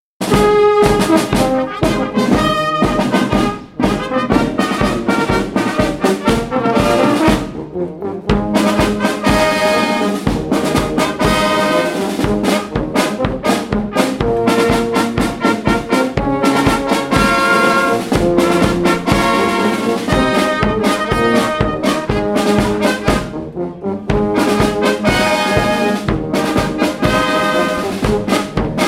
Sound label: Amateur Marching Band Brass Band; Brass band playing a march in the street; Concert Sound Effects